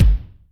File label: Velo Room, Yamaha DTXpress Acoustic Kick